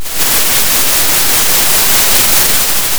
pop, Sound of a thunderstorm

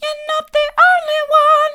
soft, vocal

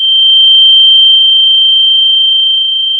Sound of a tea kettle whistling

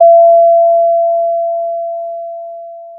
Sound of a doorbell

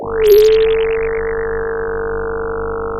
Sound of a phone ringing

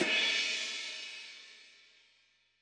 Crash, drum

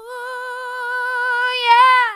ooh yeah mid, vocal

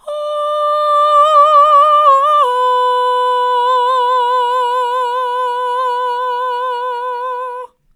ahh mid, vocal

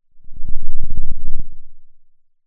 Sound of a lion’s roar

